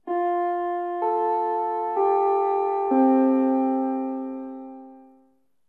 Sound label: CHIME IN; School Days LxH; PS2